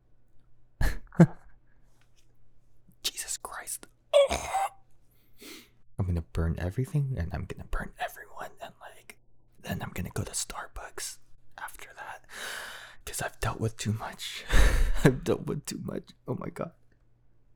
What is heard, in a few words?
Mouth Sounds; My Enemy